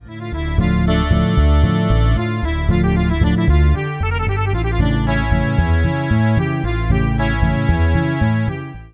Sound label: ringer; Ringtones